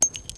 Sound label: gravel; Mafie 1